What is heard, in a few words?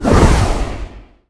jump